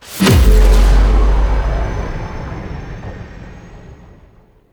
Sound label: Vault Heart Broken Zap Success; Ambience; Gameplay; Warframe; Infested Eris